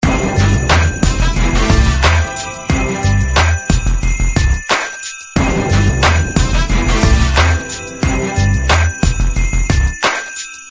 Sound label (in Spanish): musica